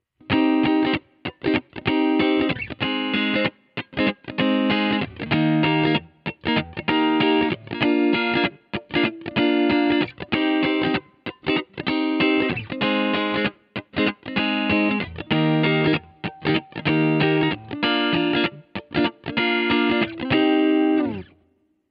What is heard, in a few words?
gt clean cable funk solo